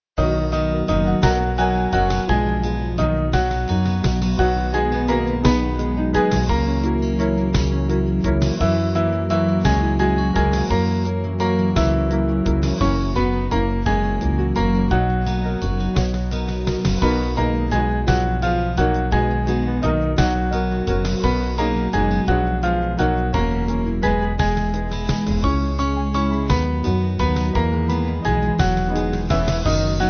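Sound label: Band, Music Backing Files for Churches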